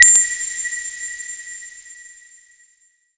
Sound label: SHORT, SHORTNOIS